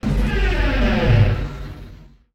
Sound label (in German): RAUMSC; Best Service; Advanced Mega Effects